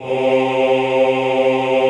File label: OHH C2, Classical Choir; Best Service; Extended Classical Choir